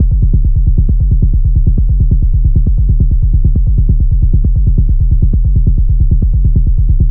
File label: Bass Loop D 135, Techno; Ghosthack; AC2024 - Day 1 - Techno Sounds 2025